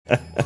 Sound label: short chuckle